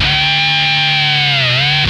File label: SCREAM, Funk Guitar; 90 s Sample CDs; Zero G